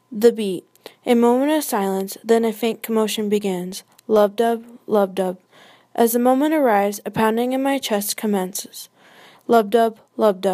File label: the beat